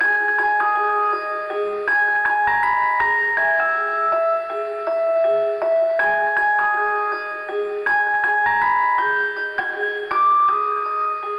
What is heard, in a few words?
Icecream Jingle; lego the incredibles 2018 pc